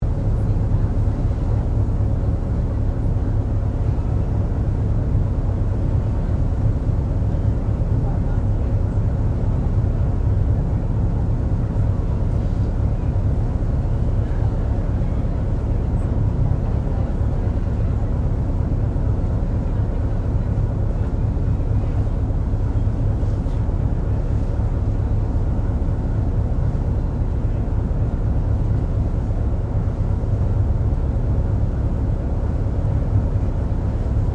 Boat Ferry Freshwater Class Sydney General Ambience Stereo; Manly Ferry; Boats; Vehicles; SoundEffects-Foundation1